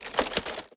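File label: inspect movement; valorant; araxys operator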